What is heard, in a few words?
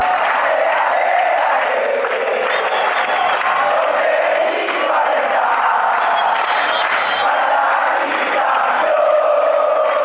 deportivo cali chant